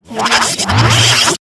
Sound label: radio transition effect